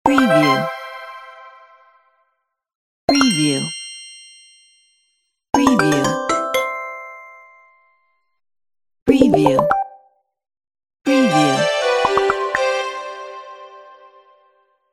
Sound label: bubble pop win pack